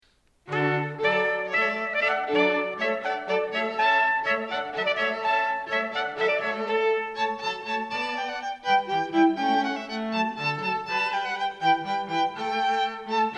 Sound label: Hornpipe, Trumpet